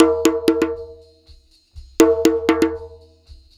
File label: perc, Roots Disco; USB Soundscan vol.32; AKAI